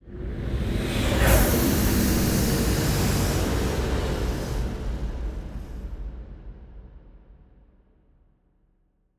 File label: ship juggernaut move; ships; Stellaris; toxoids